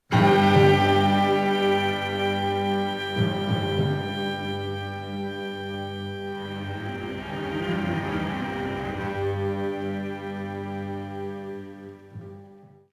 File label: Suspense, music; Suspense 2; Star Trek TOS